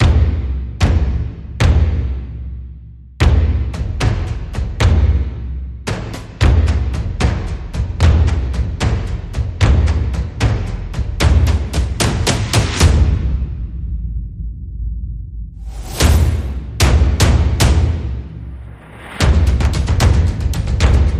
Horde, Percussion Only

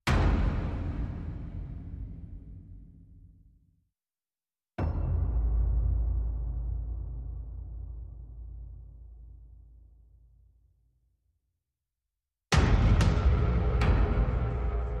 Percussion Only

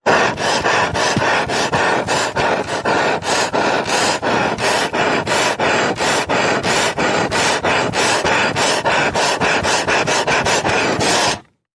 Frantic Saw

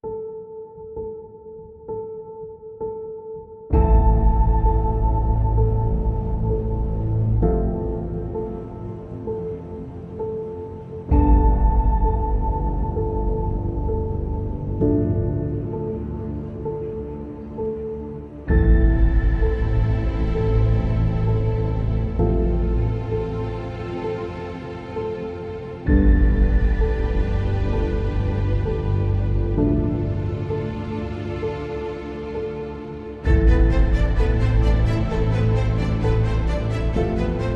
No Drums and SFX

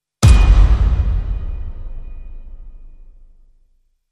Low Hit

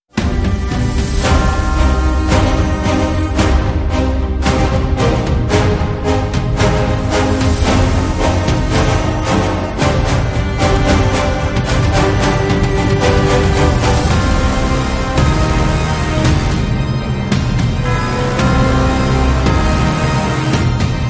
No Choir

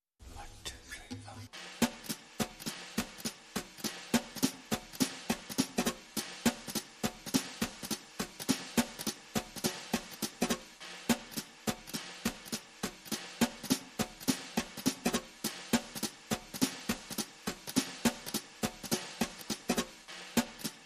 Drums Only

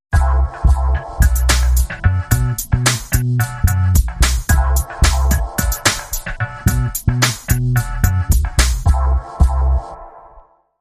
Bass Flow

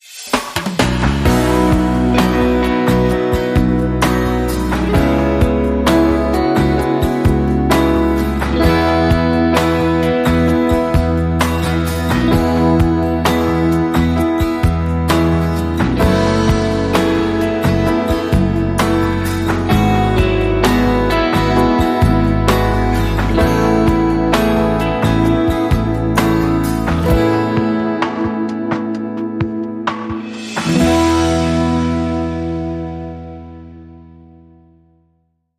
Sensitive Snare, Short

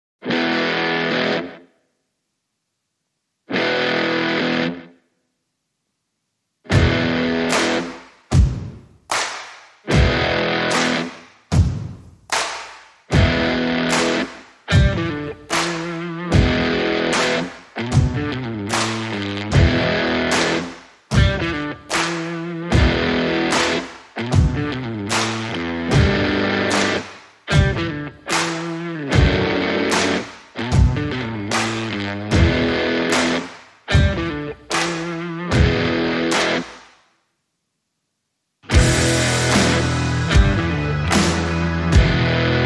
No Choir No Lead Guitar

